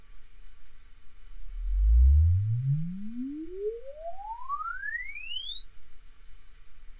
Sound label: Alesis uncal sweep